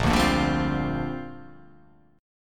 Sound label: A sharp 6th Add 9th